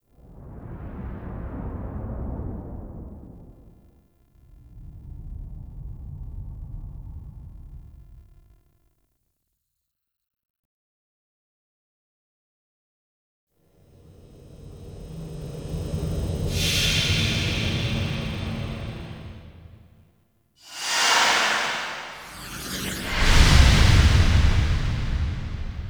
Diorama Happiness SFX Stinger; Warframe; Duviri; Dioramas

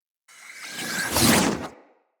Shrimp Lantern Weapon Projectile By; Creatures; Baliq

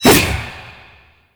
Void Tonfa Whoosh, melee weapons; Warframe; Zariman Tonfa; Void Mode

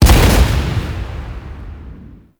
Spin Beam Explosion; Warframe; Tau; Sentient Dactolyst; Weapons

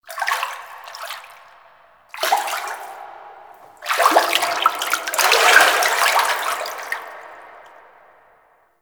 Foley; Warframe; Tau; Cinematics; LastBreath

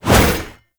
Staff Swing Sweetener; Warframe

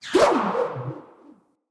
Rope Winch Rope Launch

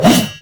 Heavy Melee Whoosh; Enemies; Warframe; Grineer Nightwatch; Grineer Fortress Heavy Melee

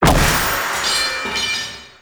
Royal Guard Sceptre Drop; Warframe; Grineer; Foley

